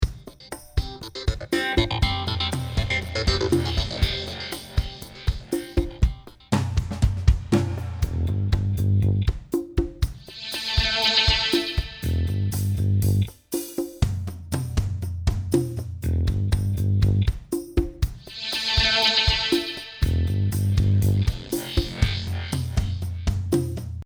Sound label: bass